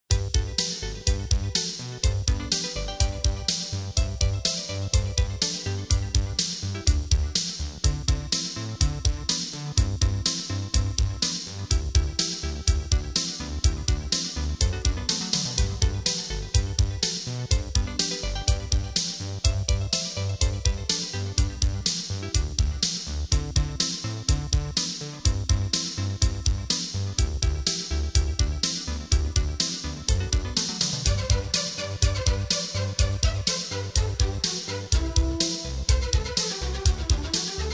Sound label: Flutes; LemEngine